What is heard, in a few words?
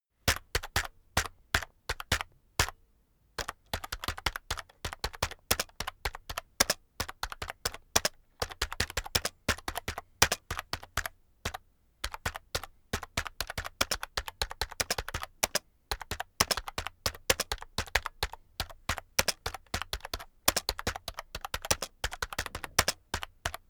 keyboard external type sequence slow heavy; kommunikation; computer